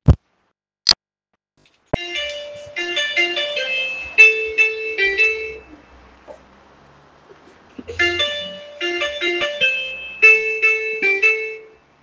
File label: Kicker Goal Sound